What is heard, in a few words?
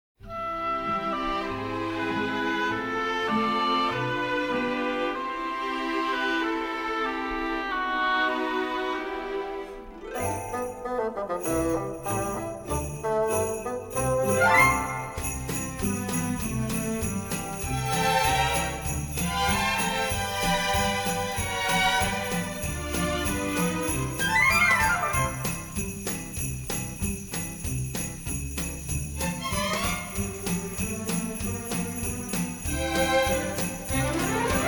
Accomp